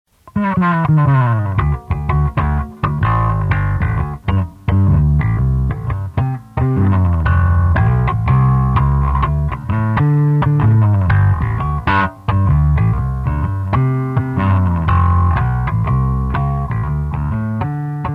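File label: jazz, bass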